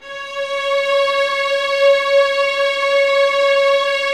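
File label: 2nd violins sustain c#5; Sonatina Symphonic Orchestra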